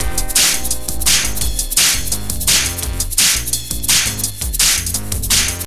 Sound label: Beats